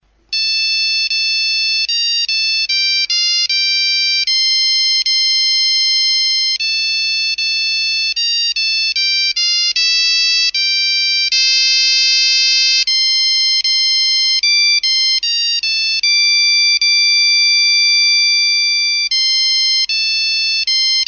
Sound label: classic motorola